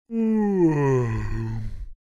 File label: Middle aged male yawn sleepy